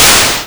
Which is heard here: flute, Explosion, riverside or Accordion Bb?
Explosion